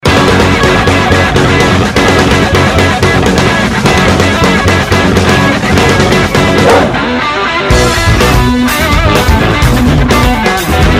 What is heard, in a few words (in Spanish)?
Perro Funk